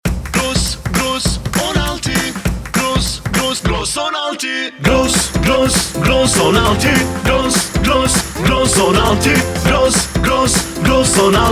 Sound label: jing